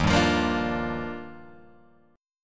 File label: D sharp Major 9th, Guitar Open G